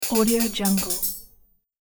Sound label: ui future menu